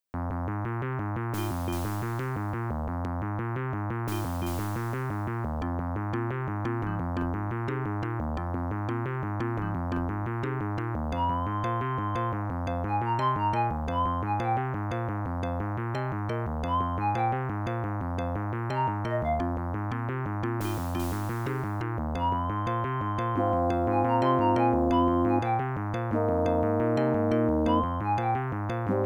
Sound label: Treacherous Forest (IBM-PC AdLib); Hocus Pocus